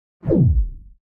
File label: Whoosh Short And Deep Sci-Fi; Hollywood Edge; sound designer's toolkit v2